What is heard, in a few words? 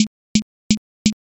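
footsteps leather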